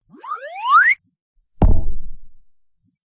Slip And Fall

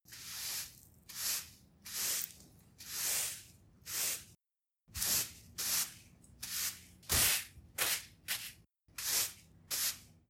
Broom Sweep Outdoor

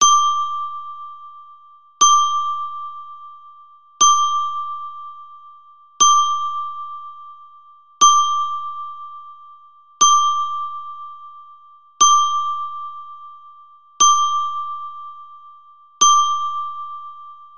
Thirteen Clock Chimes High

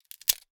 Wood snap

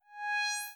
Professional Logo

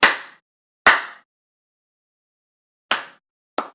Claps

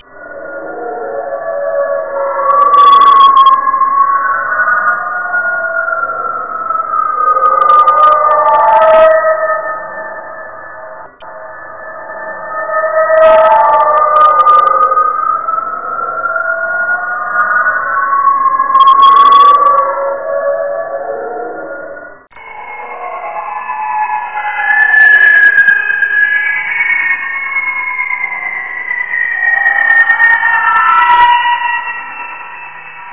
Spooky Seagull

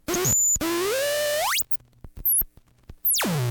Skwaak

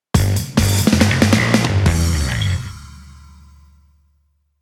Drum Bass

